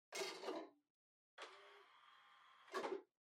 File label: Portable Stereo Far CD Put In Close